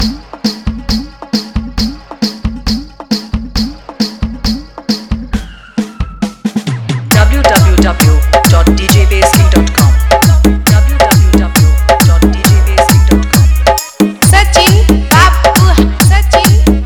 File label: Hard Vibration Mix